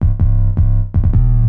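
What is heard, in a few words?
synth bass, drum and bass, 160 bpm, C; eJay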